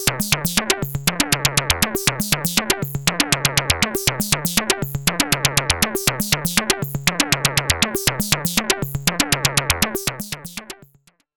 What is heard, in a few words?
Reon RE501-R Driftbox-R Wild Percussion Sequence